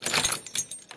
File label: metal Crate Open